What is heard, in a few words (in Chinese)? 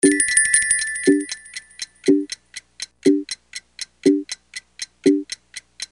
倒计时; ArrangeTheShelves